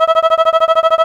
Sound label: Quica Downstroke